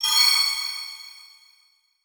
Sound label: Transition Metallic Hit; Production Element; Title Transition; AdobeCC